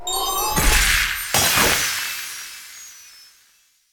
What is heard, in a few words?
Ice Thorn Stalact